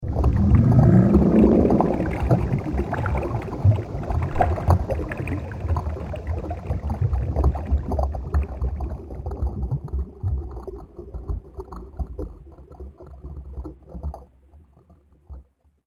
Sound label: River, scary soundscape; The River Styx; fantasy